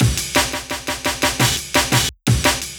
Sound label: Energy Pitched, Loops, breaks; m8 breaks